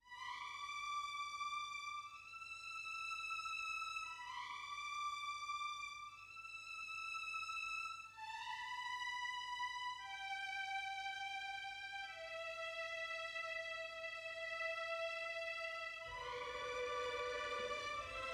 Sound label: Stings F 120bpm Vintage Vinyl